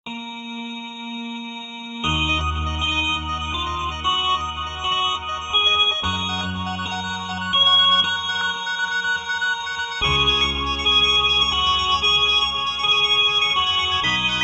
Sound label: Organ